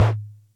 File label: Music Percussion Computer Tom, Drum Machines